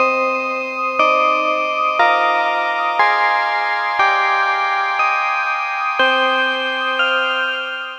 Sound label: Emotion Break, Bells & Tines; Sonic Foundry; The Electronic Music Manuscript Collection